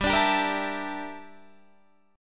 G Minor 6th Add 9th G down Guitar Standard; Gm6add9; strummed; JGuitar

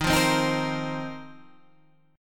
F 7th Suspended 4th D sharp

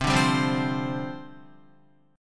C Suspended 2nd Suspended 4th, down, Guitar

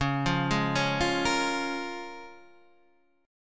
Db 6th

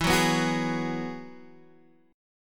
G sharp Minor 7th Sharp 5th E

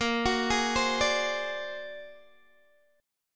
A sharp Augmented 9th A sharp Guitar DADGAD

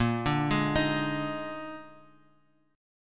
Bb 11th, Guitar, Standard; 6,5,6,8,x,x; Bb11 arpeggiated; JGuitar

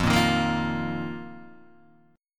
F 7th Suspended 4th F, Guitar, DADGAD

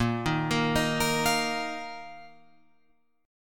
Bb Suspended 4th Bb down Guitar Open F; Bbsus4 arpeggiated

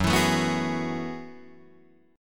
F sharp Minor 11th, Guitar, Drop D

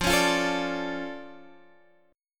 D Minor Major 7th F

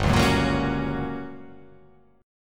G Minor Major 7th Flat 5th G

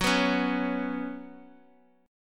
Gb Suspended 4th Gb, down; strummed; JGuitar

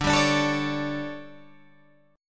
D Major 7th Suspended 4th, Guitar, Open G

